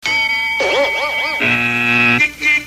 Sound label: bell buzzer boing beep beep